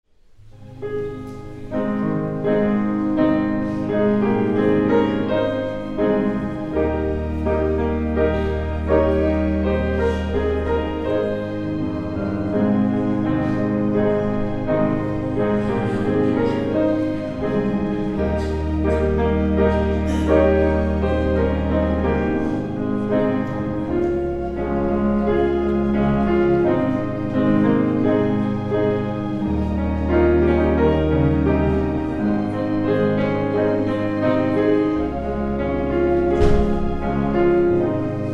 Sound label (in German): Vorspiel